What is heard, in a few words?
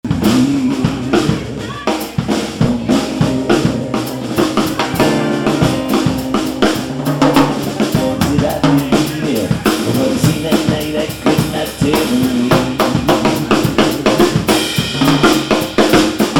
Congo Drum Solo; Congo Square Drum Solo; Tom Leddy